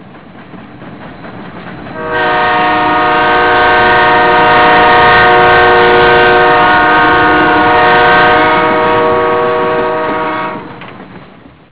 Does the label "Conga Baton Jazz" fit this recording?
No